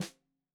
Snare 65 Reg Stereo, Deeply Sampled Drums

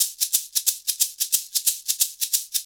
90 PERC

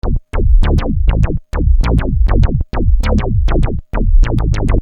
Syn Bass 100 D, Techno Synth Loops; Sonic Foundry (Sony Creative Software); Signals I

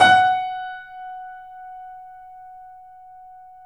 F#, The Piano; InVision Interactive - The Piano

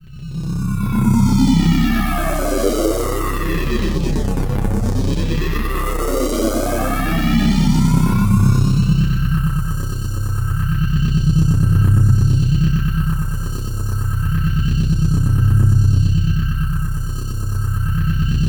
Access Virus B; Synthworx

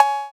TR-808 Cowbell; Roland BK-5; Percussion

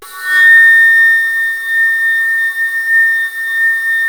ALTO A#5, ALTO FLUTE; USB Soundscan vol.23

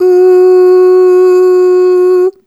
Ooh Short F#3 Dry; Harmonic Building Blocks

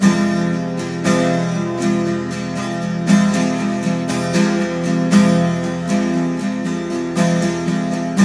12 STR MIN F#, TWELV; Spectrasonics; Hans Zimmer Guitars VOL-1

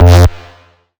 Bass F, Bass Synth

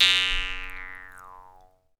JEWS HARP, Earth Tones; Jew's Harp & Spoons

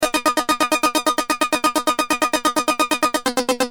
Keys, Industrial

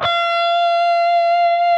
PRS F#, Guitars, LEAD; Spectrasonics; Hans Zimmer Guitars VOL-1

